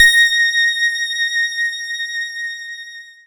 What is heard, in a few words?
PLUCKED, Synclav FM, A#; Big Fish Audio